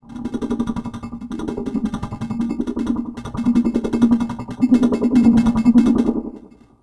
Moog LPF & Delay, contact mic, Tin Of Guitar Strings; musicradar; contact mic madness